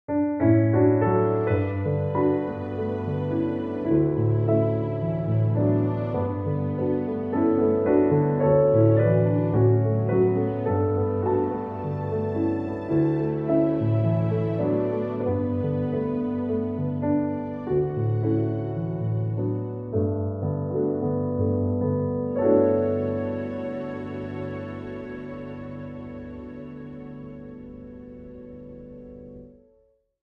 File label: With Strings